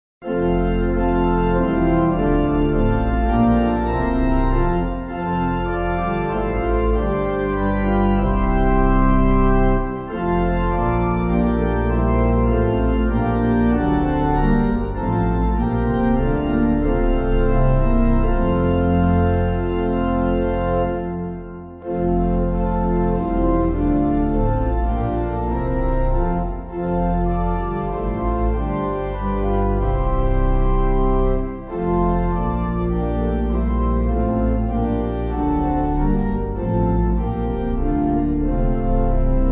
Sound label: Pipe, Free Music Backing Files for Churches, Pipe Organ